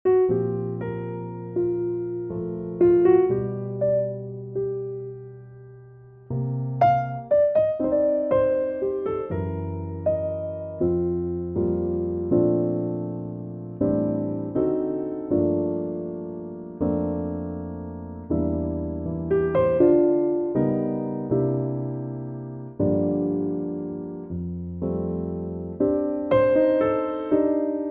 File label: piano